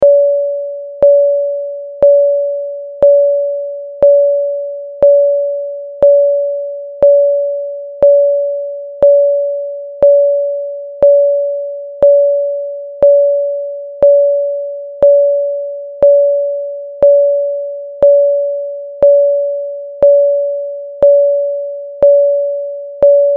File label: Chime